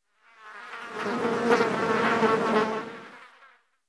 insect fly; ModernWarfare

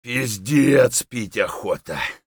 dehydrated; Escape from Tarkov

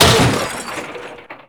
debris large impact

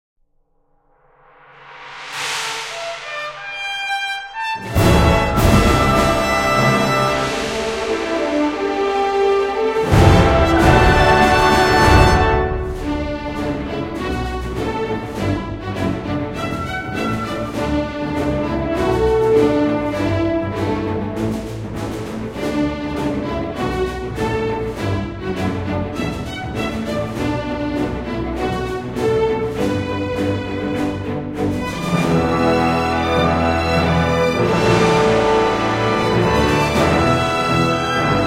china victory theme, music

